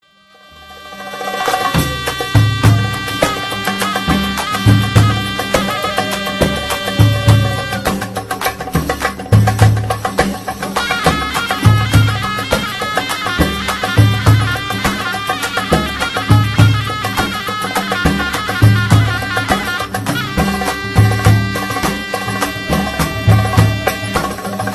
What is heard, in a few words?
Arabic Dabke